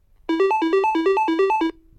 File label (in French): Telephone GIGASET DA710 Sonnerie